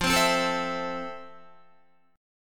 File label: F Major 7th Guitar; strummed; JGuitar